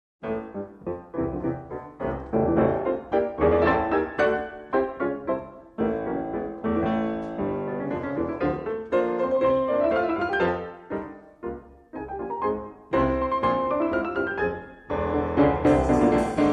JAZZ TRIO

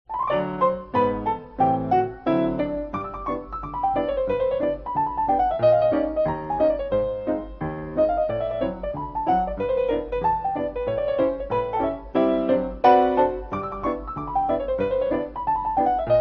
party piano source

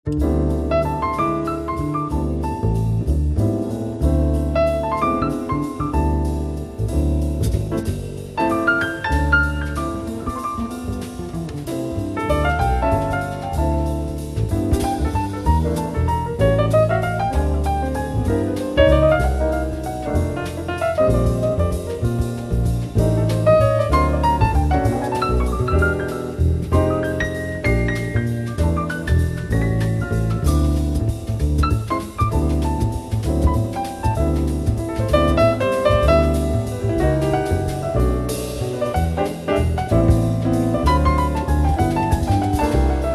jazz waltz version

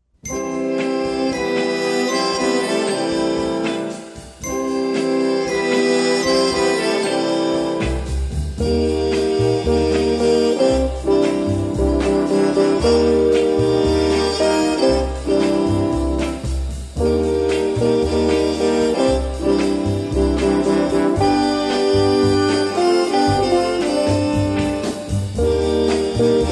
INSTRUMENTAL

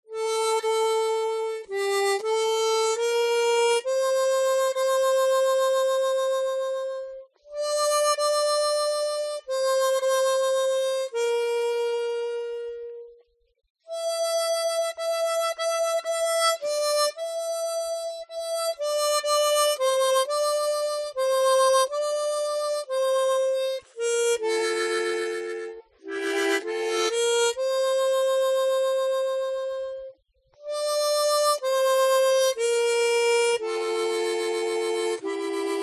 harmonica; The Wild Bunch

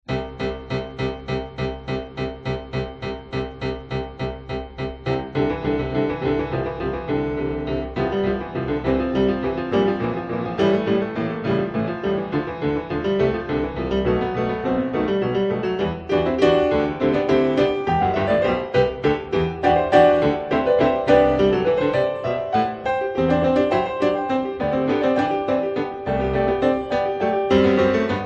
Main Titles piano

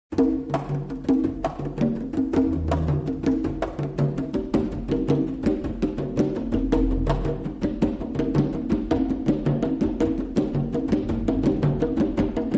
drum effects

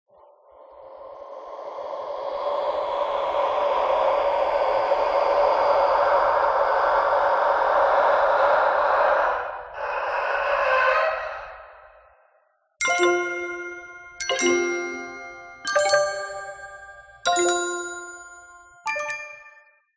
GUT STRING THRU DRUM MUSIC EFFECTS ANVIL

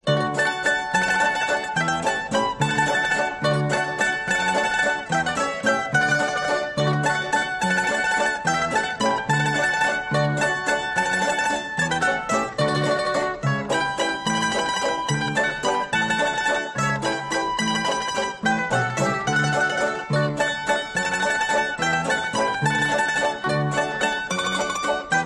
hurdy gurdy

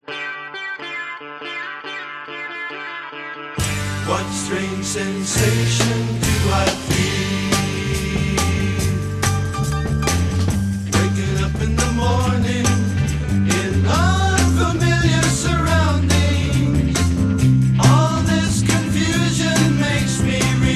Pipes of Pan Vocal

